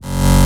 Bass Shot F#

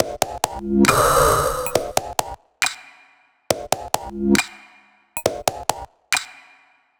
Bpm137 Top Loop; Floater; Dubstep

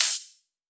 Ride, Cymbals

